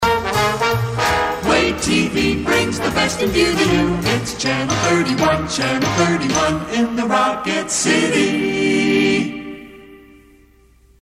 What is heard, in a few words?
jingle; waay; id